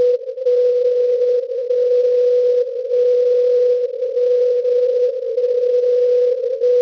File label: 5358.40 kHz cw; WebSDR records